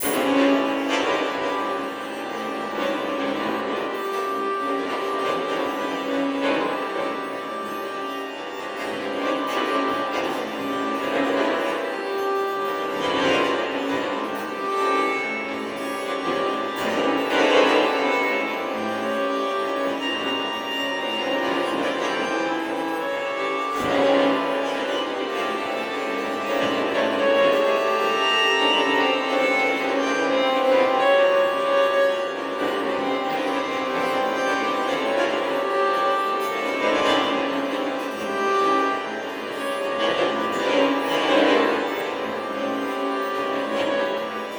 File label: Strings; Xenakis